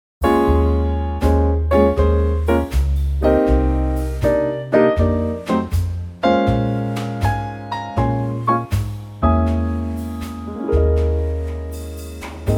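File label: Ab trio, backing track; key - Ab - vocal range - G to G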